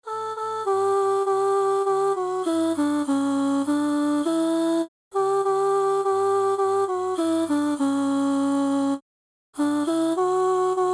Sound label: alto